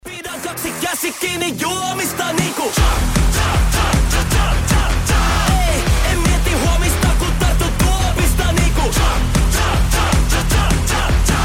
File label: cha cha cha